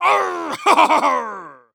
Human Pirate Laugh

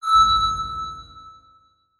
Beep High Pitch

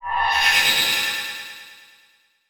Transition Delay Medium

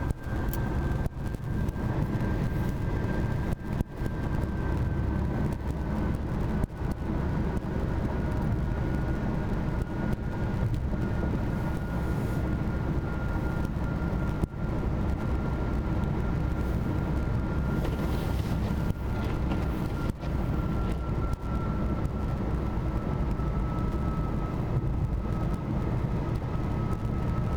Car Sounds Drive; Drive To Montreal; Car-Sounds-Driving

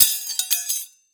Industry Tools Drop On Floor

